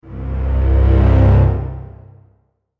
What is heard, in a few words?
Dark cinematic suspense riser horror